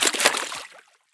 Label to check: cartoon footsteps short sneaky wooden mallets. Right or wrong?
Wrong